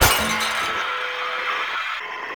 Destroy Television

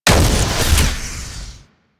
Flak Cannon Alt Fire, weapons; Warframe; UT Flak Cannon

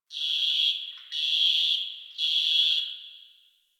Tree Critters Happy Calm; Warframe; Duviri

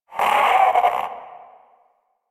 Possessed Vocal Death; Enemies; Warframe; Priest Quest; Possessed Red Veil

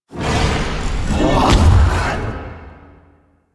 Rising Blades Cast; Enemies; Warframe; Pax Duviricus

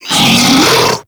Infested Diseased Ancient Pain; Warframe

